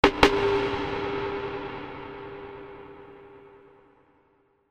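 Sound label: percussion, single powerful hit in space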